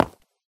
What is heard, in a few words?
footstep concrete land; ProSoundCollection v1 3 MONO